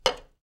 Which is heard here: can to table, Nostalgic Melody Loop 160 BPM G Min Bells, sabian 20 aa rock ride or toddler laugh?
can to table